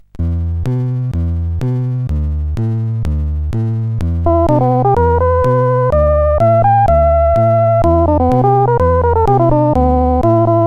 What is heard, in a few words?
Hammonds Organ, CSG8580R5